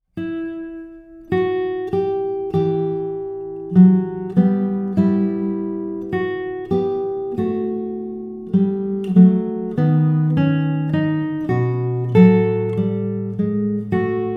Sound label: Simple Song dry